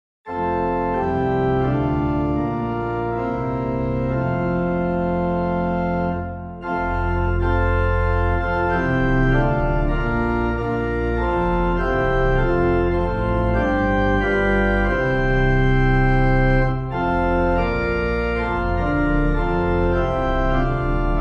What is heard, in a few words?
When All Was Formless Dark and Void, WINCHESTER OLD, organ, hymn